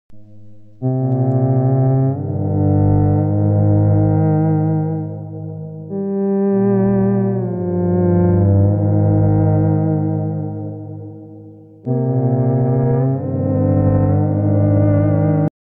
very sad

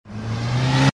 speed coil; roblox

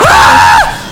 scream

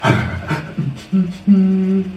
panting hmmhmm

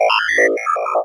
hud transmission incoming; metroid prime

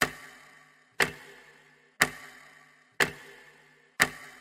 ticking sound

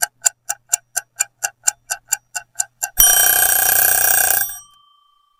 clock alarm

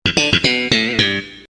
sitcom transition bass riff; seinfeld

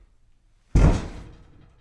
person falling

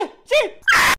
aaaaaaaa screaming; among us

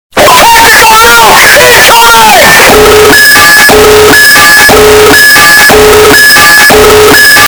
tactical nuke bass boosted

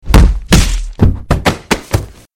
ragdol hit

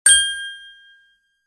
wink ding

